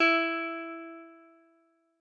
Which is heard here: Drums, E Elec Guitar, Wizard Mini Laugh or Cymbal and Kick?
E Elec Guitar